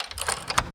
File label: Latches Lock Unlock